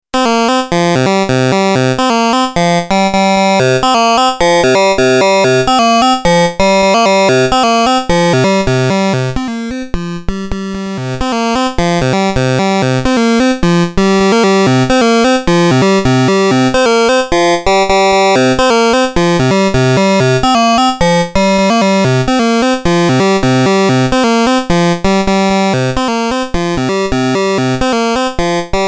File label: Analogue Solutions EKG goes VCO